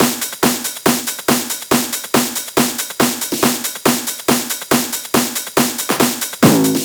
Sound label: Loop 140 BPM; vengeance essential club sounds-3